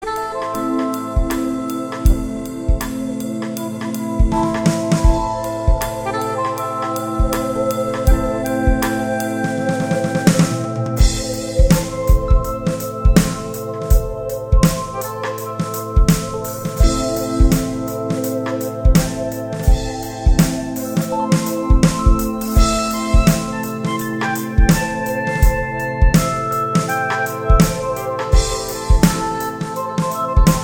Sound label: Jazz